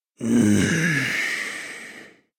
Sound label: Growl Dry, Creatures